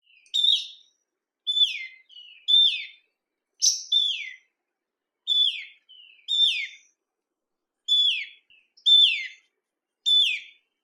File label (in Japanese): メグロ, 鳴き声; 地鳴き; 全長 13.5cm; 渡り区分 留鳥; 日本の鳥百科; 背・尾は緑色で、胸・腹は黄色。目の周りに三角形をした黒色斑があるので、メグロです。くちばしは細いです。